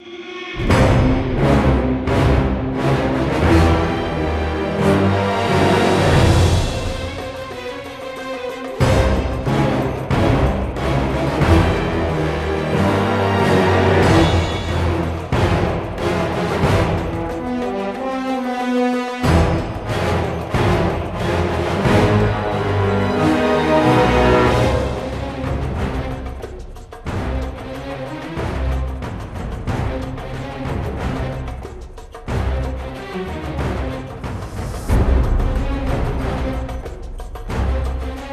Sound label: Combat - Cinematic